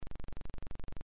delta clicks; delta 66 recording problems

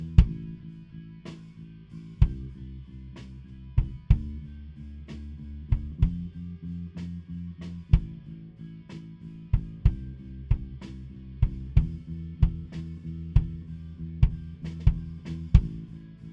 kick atm25, kick drum; recording

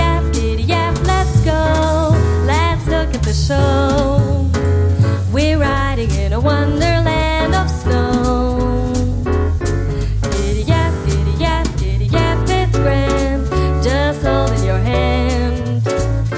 pitchshift sample

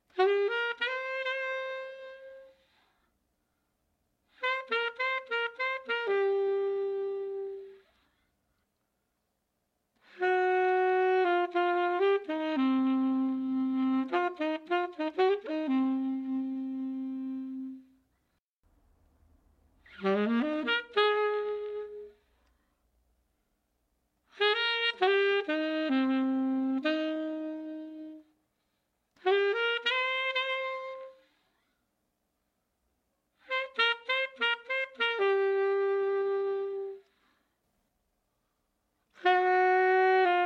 raw sax; sax recording

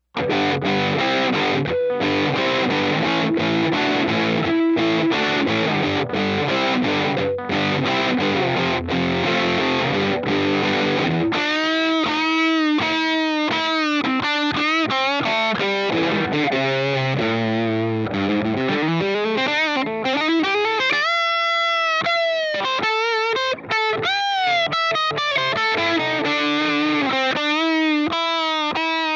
chief lead

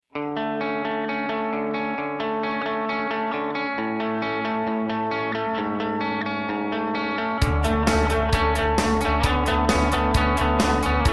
clean song; clean song with ux1

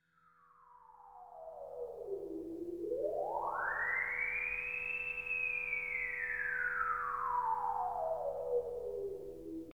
tone sweep; recreate this in a daw

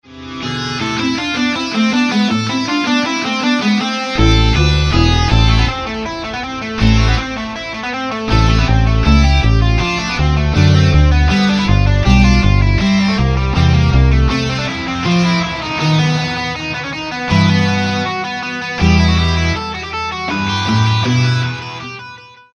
concerto for mandolin in c

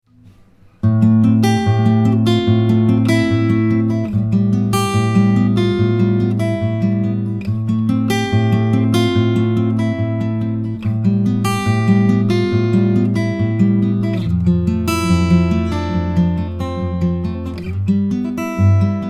picking; mics

